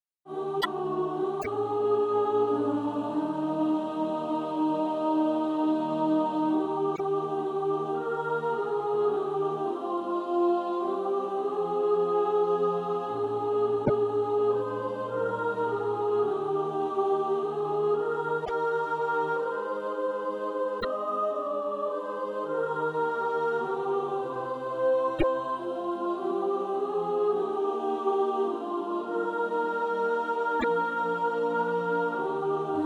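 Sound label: soprano, SATB Practise Music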